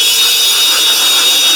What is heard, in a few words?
STORM; Northstar; The Wizard VOL-1; TERROSTROIKA